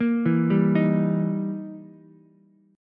E Minor Major 7th Flat 5th, E, down, Ukulele; arpeggiated; JGuitar

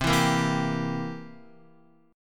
C 7th C down Guitar 4F, 4A, 5C, 5G, 5C, 6E; C7 strummed

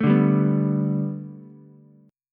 B Minor 7th Sharp 5th B Ukulele, chord; Bm7#5; strummed; 2,2,3,2; JGuitar

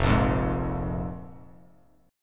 F Minor Double Flat 5th; Fmbb5 strummed; Bass Standard; JGuitar

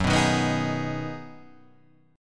Ab Minor 7th

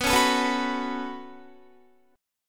B 7th Suspended 2nd B down Guitar; B7sus2; strummed

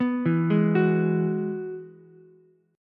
E Major Add 9th E down Ukulele C6; arpeggiated

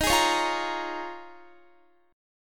Eb 7th Suspended 2nd Suspended 4th Eb, Guitar; strummed; x,18,18,18,17,17

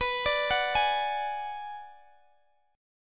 B Minor 6th B, Guitar DADGAD

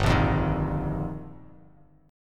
G Minor 7th Sharp 5th, Bass Standard; Gm7#5; strummed; JGuitar; 3,1,3,8

